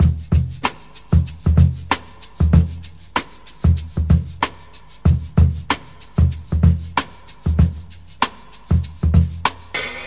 95 bpm modern breakbeat sample e key

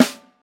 tight steel snare drum b key; snare drum samples

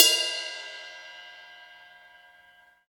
big ride sample f key

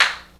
clean clap single hit a key; Mono; single hits one shots

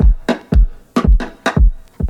115 bpm high quality breakbeat sample f sharp key; drum loop

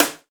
bright steel snare drum sample d key; snare drum samples

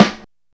steel snare drum g sharp key; snare drum one shots

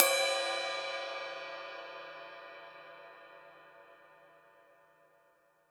big crash cymbal drum sample c sharp key

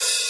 short open high hat d key

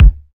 clear bass drum one shot g key; Duration 0:01; Samplerate 44100 Hz; Bitdepth 24; Channels Mono; kick drum samples

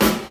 prominent snare drum sound g sharp key

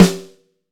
strong bottom end snare g key; snare drum samples

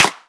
clap a sharp key; single hits one shots

